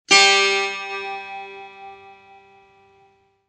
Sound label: setar sol